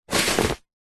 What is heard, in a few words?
foley luggage rucksack backpack pick up from concrete